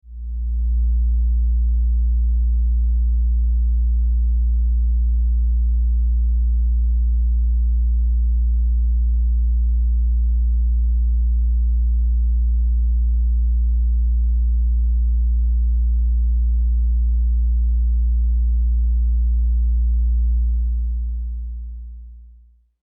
Science Fiction Scanner Drone; Tech (Sound Effects:Science Fiction)

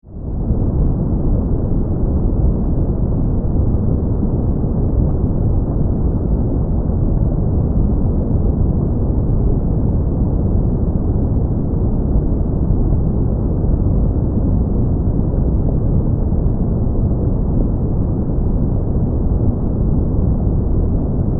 Underwater, Ambience; 5.1; BlastWave

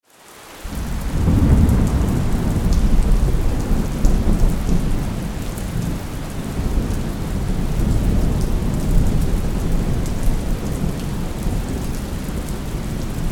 Weather Thunderstorm Constant Thunder Ambience

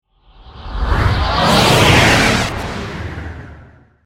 Warp, Dimensional, Science Fiction; Scifi; 5.1

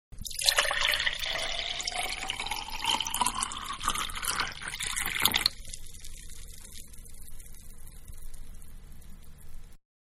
Pour Into Glass, Water; Pouring; Manhattan Production Music

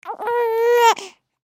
Human Cry Whimper; Cry Whimper Baby Newborn; Crying; Blastwave

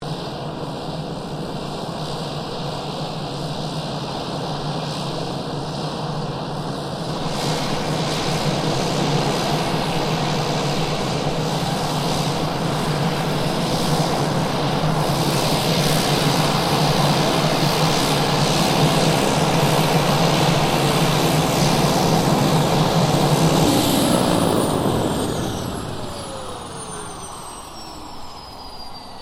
AIRCRAFT PC-9A TAXI SHUTDOWN

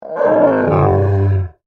Monster Groan, Horror, Monster, Groan, Creature; Monsters (Sound Effects:Horror); BlastWave

